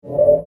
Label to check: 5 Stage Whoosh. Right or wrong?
Right